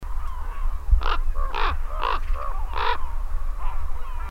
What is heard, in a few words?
corrax call